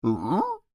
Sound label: Creature Human Moan Intrigued; Groaning; Voice; Groaning Sound Effects; BigRoomSound